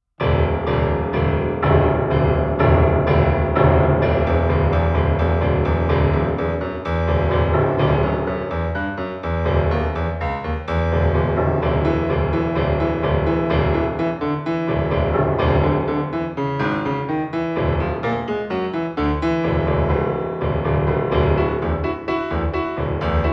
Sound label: With drums and pipes; Bartok